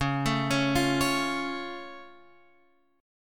C sharp Augmented down Guitar Open F, chord; arpeggiated; JGuitar

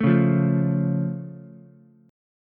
A Augmented A, Ukulele; strummed; 2,1,1,0; JGuitar

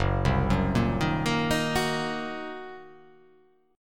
Fsharp 6th Flat 5th down, chord

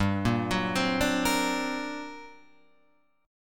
G Minor Major 11th, Guitar, Open F; arpeggiated; 7,5,6,7,5,5

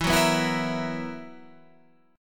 B Major 7th Suspended 4th E

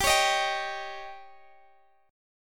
Eb 7th

